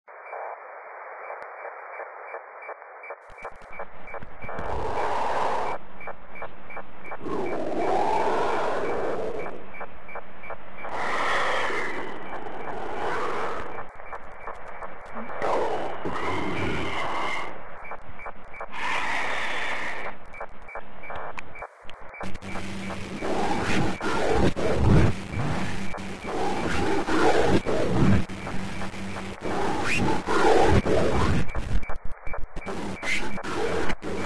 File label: Evil Radio